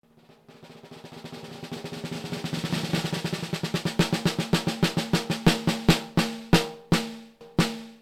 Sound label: snare